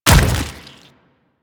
Ancient Tank Step Default, Infested footsteps; Warframe; Emissary Ancient Tank

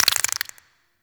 Infested Burst Rifle Mech, weapons

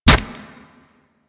Kunai Impact Flesh; Warframe; Hit Effects

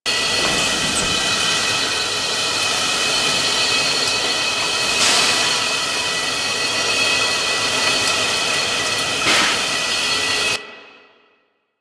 Vent Idle Loop; Alchemy; Warframe; Entrati

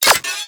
Sniper Rifle Mech; Warframe; Weapons; Corpus

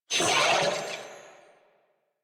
Death Grineer Male; New War; Narmer

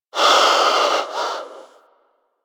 Bird Snore; Warframe; Hunting Critters; Sawgaw

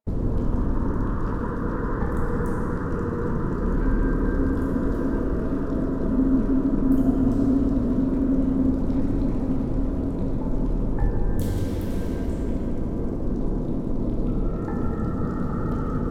Cave Background Loop A; Warframe; Ambience; Grineer; Grn Boss Room 1

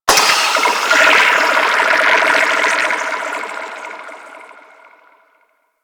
Squid Lancer Ink Blob; Warframe; Grineer

